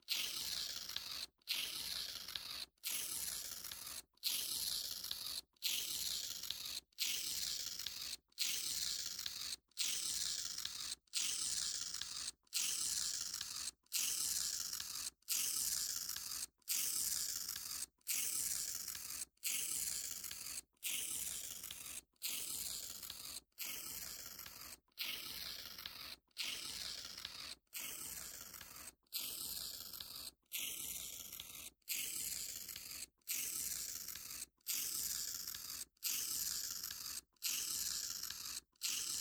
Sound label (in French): Papier